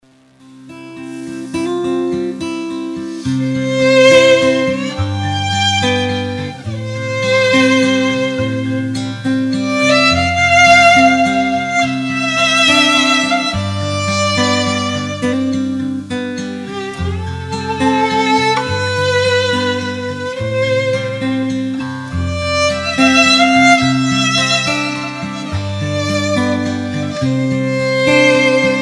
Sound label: violin guitar